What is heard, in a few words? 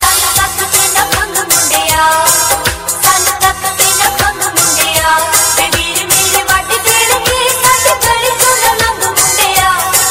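Ringtone, Punjabi Pop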